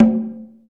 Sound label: PER, Percussion